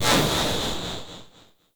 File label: Electro Mag Whizz By B, Corpus weapons; Warframe